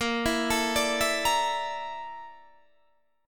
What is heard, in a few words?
Bb Major 7th Flat 5th, Guitar, DADGAD; 20,19,19,19,19,20